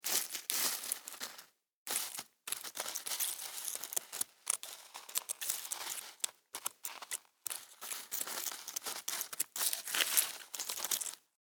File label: Insect Move Object On Dirt; Insect Movement; Animals; Insects